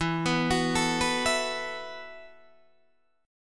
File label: E Suspended 2nd Suspended 4th E, Guitar, Open D; 14,14,16,15,14,14